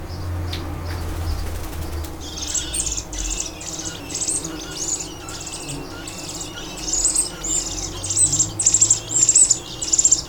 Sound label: Bees And Birds